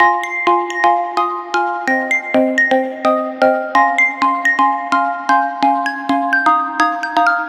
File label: future bass house loop